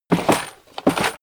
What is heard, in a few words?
tool draw